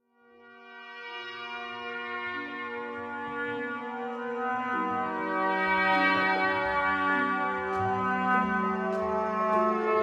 22edo; concept

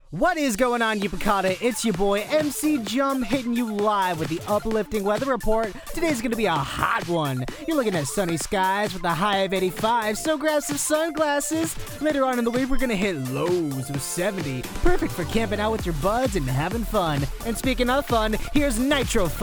uplifting

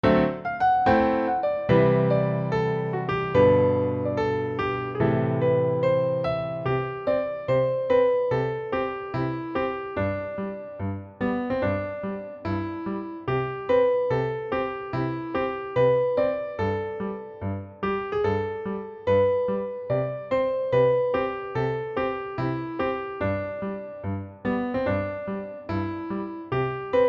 mewsic box

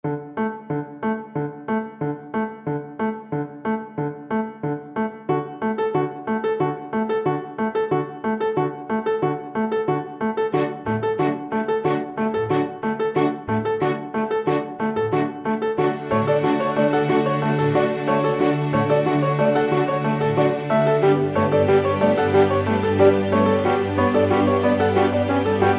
ps jingle

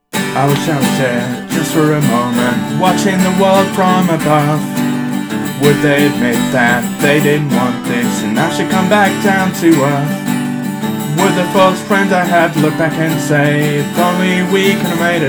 floating above the world acoustic

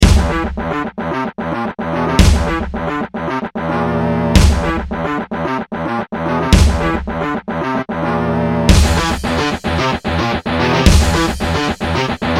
gba soundfont mashup; doom 1

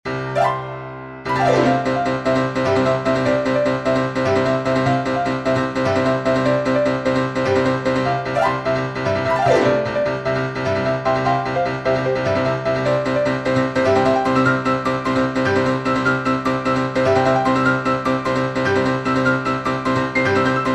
piano duet; sonic the hedgehog 3

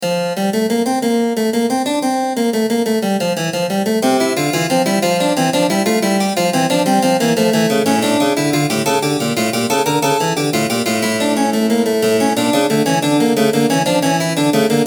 fugue in f major

